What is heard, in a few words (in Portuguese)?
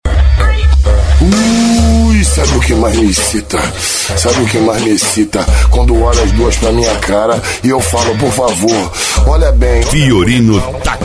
especial funk e electro house